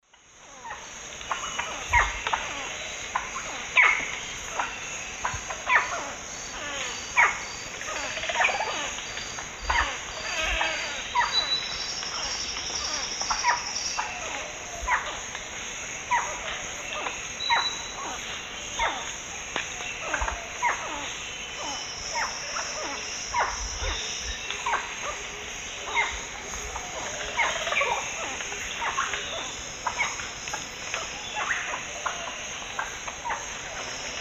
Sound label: Psarocolius yuracares, calls and subsong; S. America; Eastern Lowlands